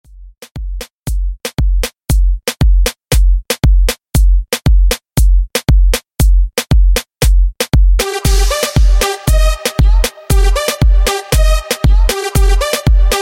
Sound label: In Outro Moombah 117Bpm Clean